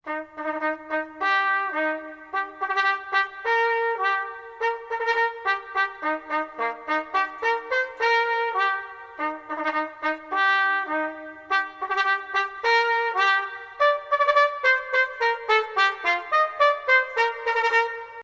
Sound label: trumpet; Arban